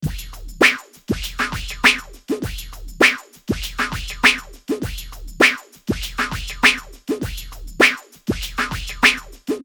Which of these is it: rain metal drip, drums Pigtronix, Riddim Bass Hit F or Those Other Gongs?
drums Pigtronix